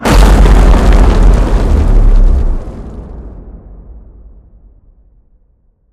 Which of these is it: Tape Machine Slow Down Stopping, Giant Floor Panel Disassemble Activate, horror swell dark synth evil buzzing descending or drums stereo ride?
Giant Floor Panel Disassemble Activate